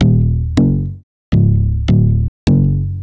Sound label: Bass, Futurist Drum & Bass; Sonic Foundry (Sony Creative Software)